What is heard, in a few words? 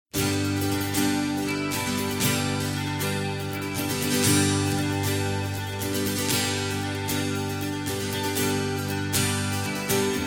G No Backing Vocals, backing tracks